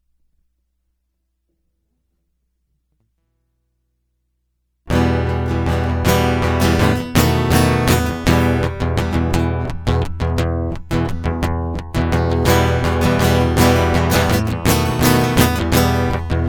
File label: We Three Kings Guitar Loop